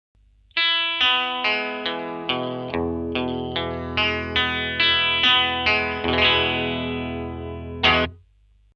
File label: jag bridge strangle, vintage and reissue jaguars; sound samples of vintage and reissue jaguars and jazzmasters